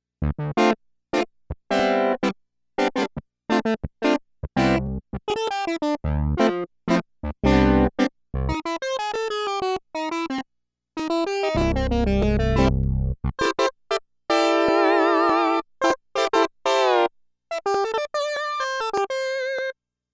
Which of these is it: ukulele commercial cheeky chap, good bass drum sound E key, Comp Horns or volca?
Comp Horns